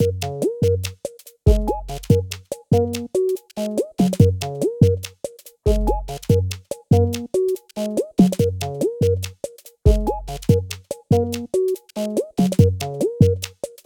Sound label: Acid Shop; Holedown